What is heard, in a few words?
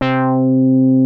FAT BASS, BASS STATION; Syntec; Wall of Sounds